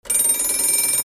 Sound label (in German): Wecker